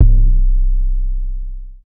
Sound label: Not Nice 808's